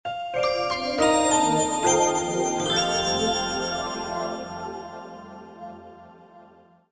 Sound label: off, system; UTStarcom CDM-8955